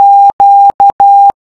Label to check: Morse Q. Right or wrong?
Right